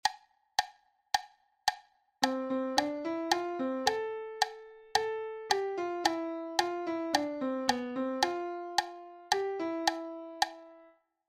syncopation

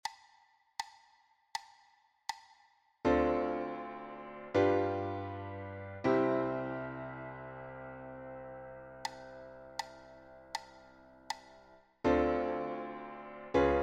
IIm subV7

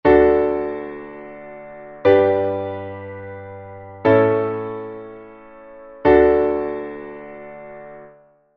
D major three chord

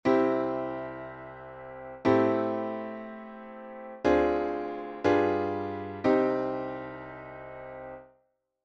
related IIm modulation

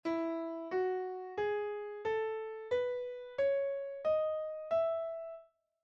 E major scale

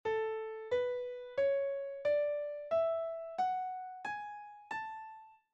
A major scale

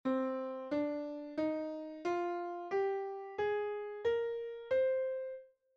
C minor scale

